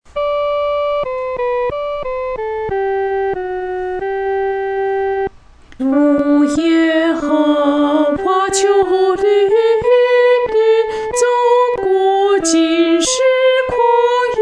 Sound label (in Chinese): 独唱（第一声）